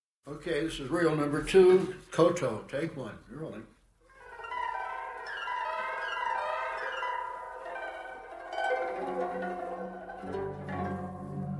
Koto

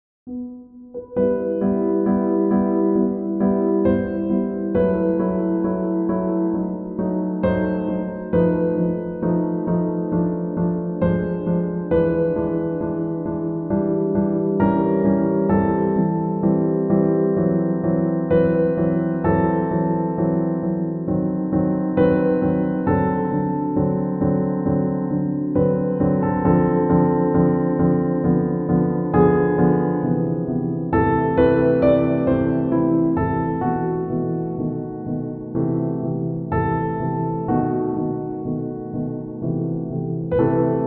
Prelude in E Minor; Chopin; From The Pianist

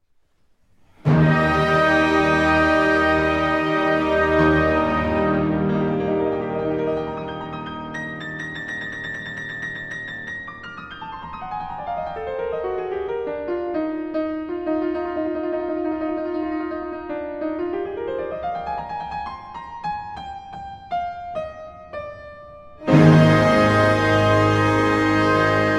Piano Concerto in E Flat Major, Allegro; Piano Concerto No. 5; Op. 73 Emperor

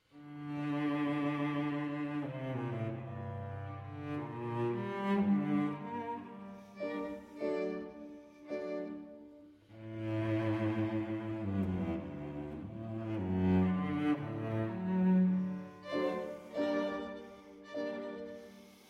Piano Quintet in G Minor, Poco Andante - Allegro

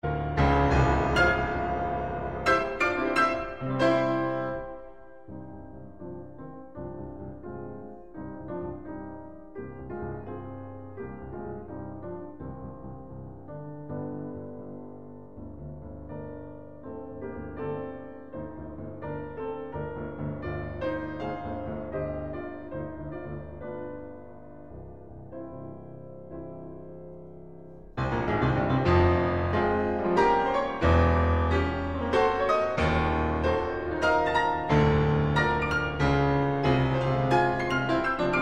Piano Sonata in F Minor, Allegro Maestoso

